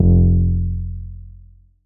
bass F1, Korg MS-20

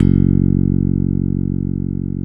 Korg Wavestation F#1; Back In Time Records; Korg Universe VOL-1

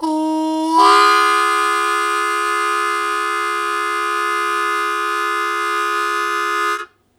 Blues Chord Attack C#1, Harmonica; Zero G - Harmonica

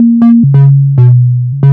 bass 138 A#, bass-licks, Techno; instrument-loops

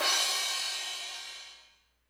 crash, Hardcore Breakbeat; Big Fish Audio; Hardcore Breakbeat Action; Rhythms 4 Life 151 Ebmin

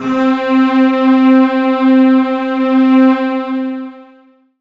pad, Dance Synths

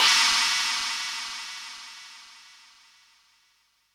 Roland U110 Drums G#5

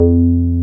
303, TB-303 BASS

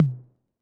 606 Tom Low; Concrete Collage Drum Kit; Concrete-606

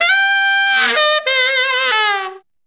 SAX C, ACID JAZZ; Best Service; Advanced Media Trax Modern Composer

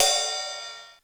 Ride, Korg DSS-1, Drum Machines

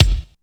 DR660 DANCE, DRUMS PERC; Rubber Chicken Software Sample Bank

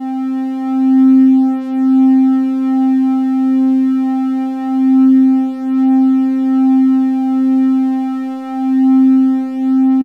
Synth, Raw Analog Multi's; Dream Zone

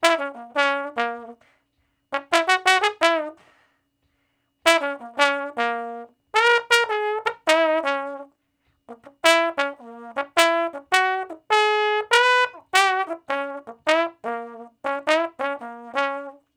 Trombone Shuffle (Db); Sonic Foundry (Sony Creative Software); Horncraft for R&B; Solos

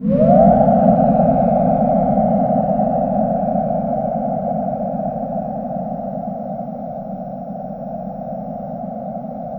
sea whistle; Spectrasonic Distorted Reality 1

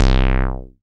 SYN TB SUB, Roland TB-303

